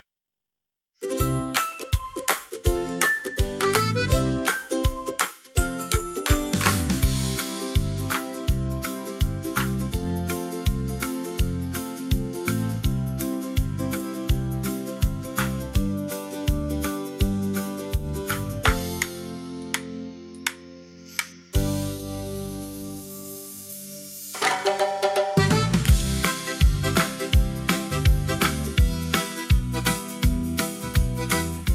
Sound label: jezdym stond Instrumental